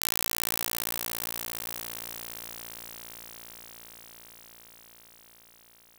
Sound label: impulse response